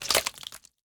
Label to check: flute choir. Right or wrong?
Wrong